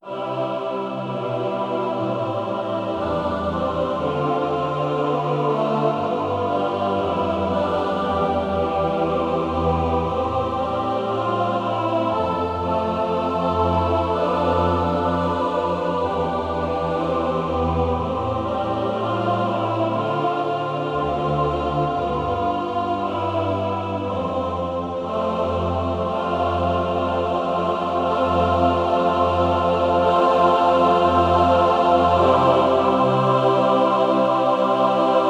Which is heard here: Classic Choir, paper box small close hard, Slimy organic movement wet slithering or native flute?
Classic Choir